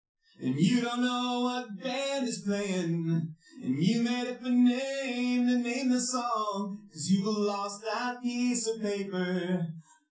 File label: drums; SiSEC10; underdetermined